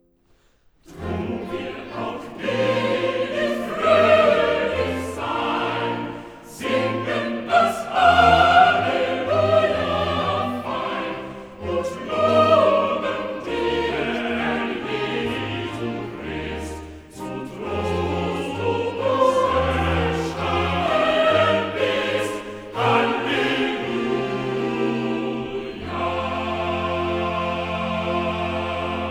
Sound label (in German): Choral; Cantata, BWV 145 'Ich lebe, mein Herze, zu deinem Ergötzen'; Bach; Eisenach; Easter Mon & Tue